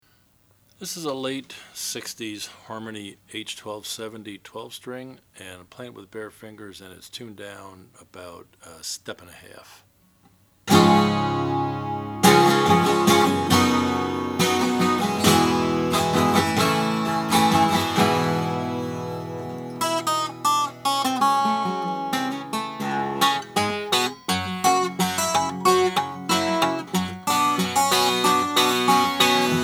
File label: harmony h1270 natural